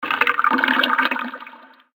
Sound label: element water; maoudamashii